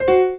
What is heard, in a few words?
error